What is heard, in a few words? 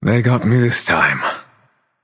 death; smite; voicepack; merlin